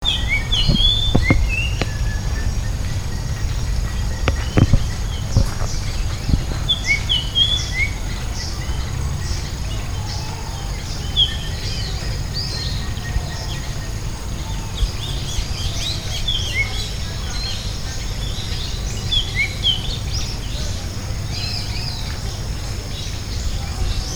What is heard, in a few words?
ikaru, birds